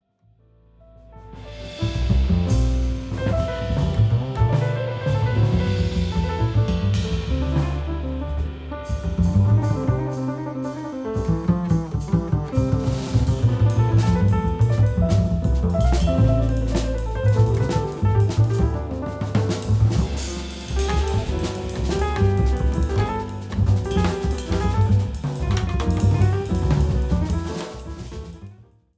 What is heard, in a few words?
Moving Violation